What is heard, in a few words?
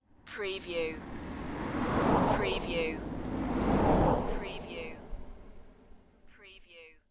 Two Cars By